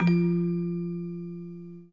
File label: orgel